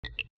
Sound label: usb effect, ui; Coolpad Fancy-3